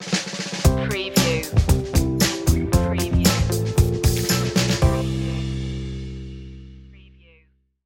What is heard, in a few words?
relaxing groove logo